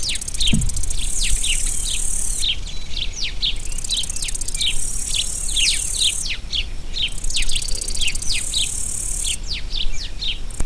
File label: house sparrows